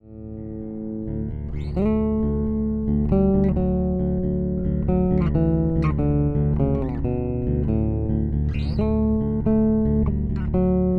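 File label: Sadowsky NYC Modern Bridge Pickup aktiv, bass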